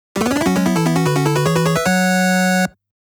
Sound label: Winning